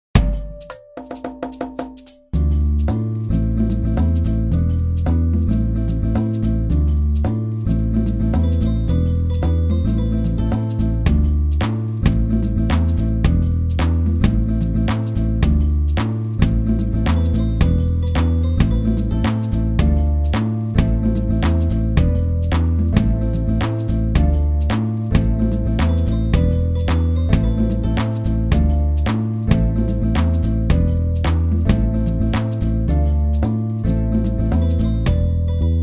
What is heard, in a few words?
music instrumental